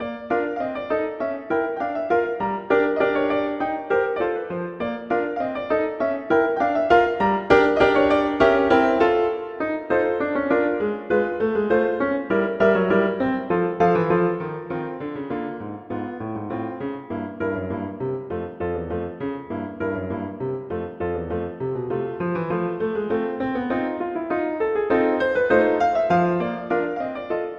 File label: Polka; Tchaikovsky